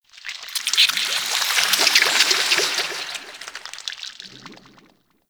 Squelch Goop One Off; Props; Warframe; Tau; Ambience

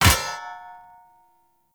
Short Sword Medium Parry Impact, Weapon Impacts; Player; Swords; Combat

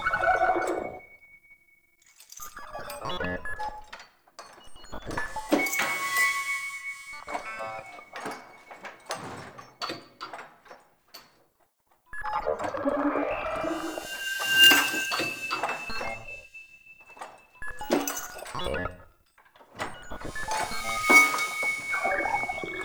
Cover Machine Loop; Ambience; Props; Warframe; Entrati

